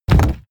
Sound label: mechanical switch stone large push